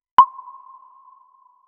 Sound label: water drop echo in underg; underground